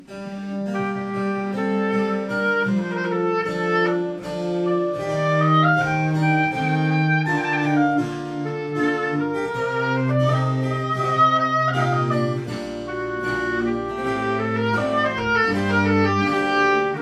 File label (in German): Sonate G-Moll; CPE Bach